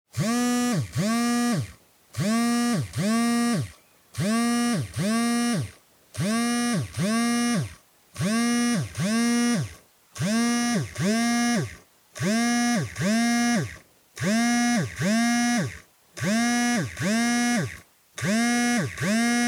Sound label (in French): vibreur; Vibreur lui; La Perruche